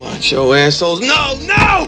pain; mcclane

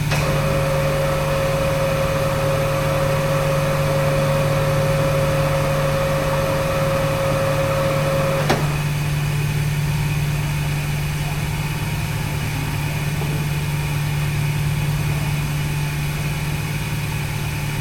pump; openffa; aggrocult

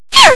pain; vortex; speedy

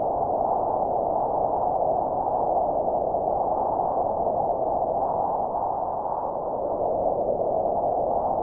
soft wind; spawngirlsounds

